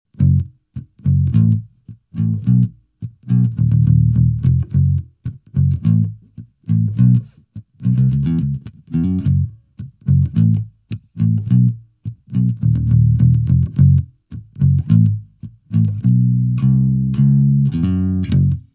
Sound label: Bass